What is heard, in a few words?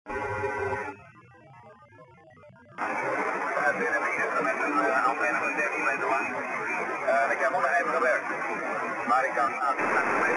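160 circular polarization noise reduction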